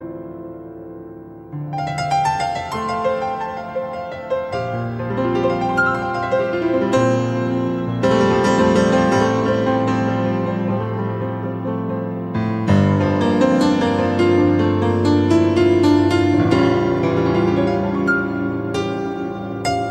Blue Piano

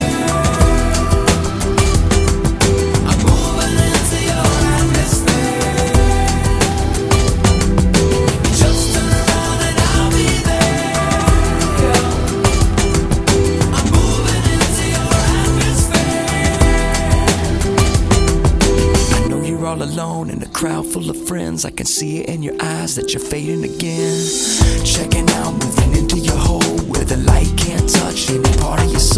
atmosphere

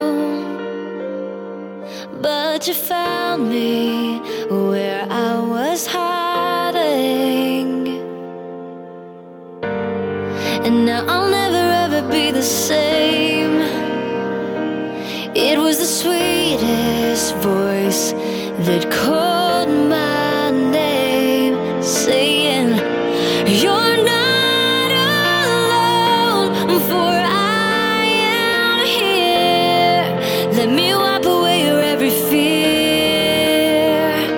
Acoustic Version

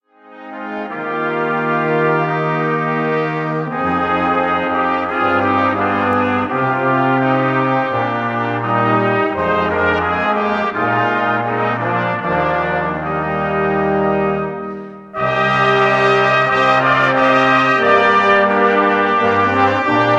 Brass

